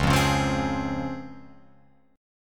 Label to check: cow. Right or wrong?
Wrong